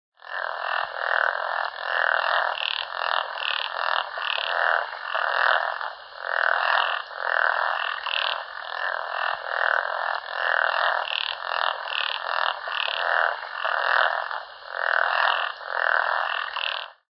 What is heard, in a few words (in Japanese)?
tsuchi-gaeru